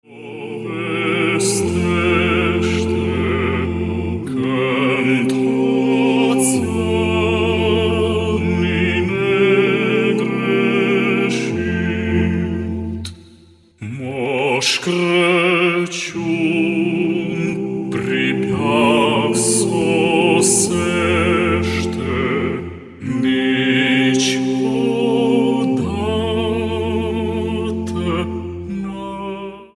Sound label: Voice Quartet; Mos Craciun